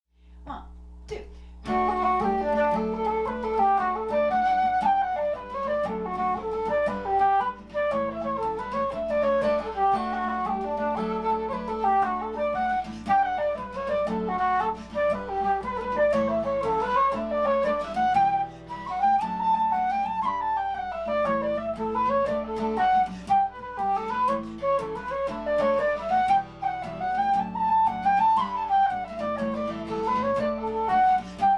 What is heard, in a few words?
Flute and Guitar